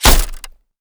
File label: impact glass shield; Escape from Tarkov